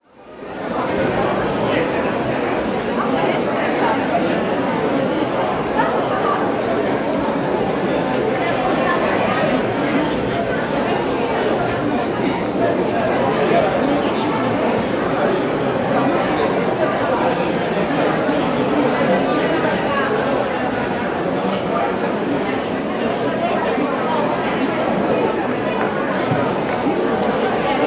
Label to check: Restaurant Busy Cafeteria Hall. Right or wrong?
Right